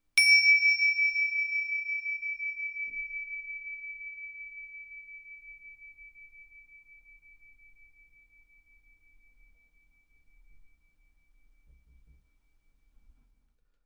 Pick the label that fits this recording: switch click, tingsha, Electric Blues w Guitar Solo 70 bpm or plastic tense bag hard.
tingsha